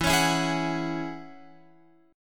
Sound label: E 6th Add 9th, Guitar, Open F; strummed